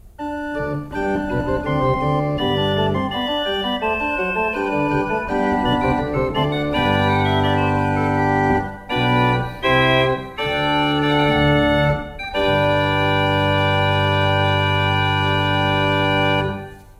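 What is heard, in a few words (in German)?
Grenzing 7 II P, pfeifenorgeln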